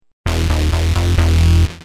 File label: Heavy, Bass; Industrial